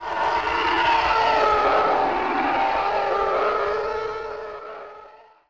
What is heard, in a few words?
tie fighter; starwars; episode vii the force awakens